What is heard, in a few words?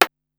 Hammer, Korg Wavestation-SR Single Hits